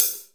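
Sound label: 14 hi hat closed; Best Service; Real Mega Drums VOL-1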